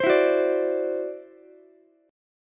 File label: E 7th Suspended 2nd Sharp 5th, E, down, Ukulele; E7sus2#5; strummed